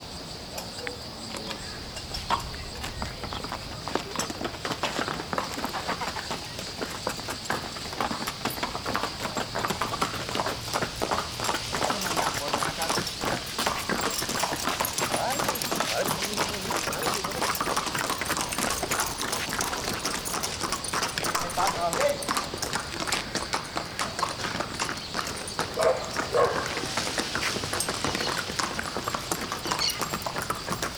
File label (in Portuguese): Cavalos trotando no asfalto com vento suave nas arvores, pessoas, cachorro; passaros; animais